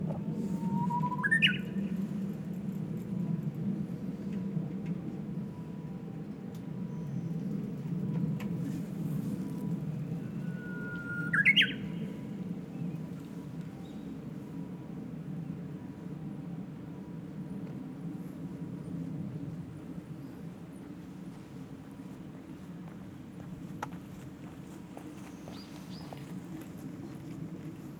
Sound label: LOW CUT 75Hz; SONY PCM-D100